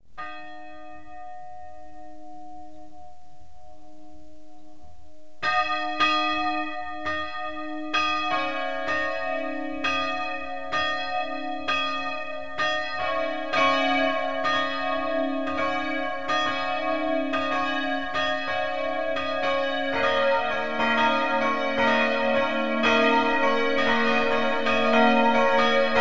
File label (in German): Glockenland Kath Pfarrkirche St Martin